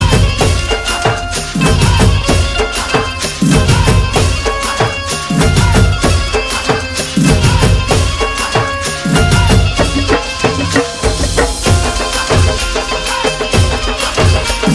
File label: garba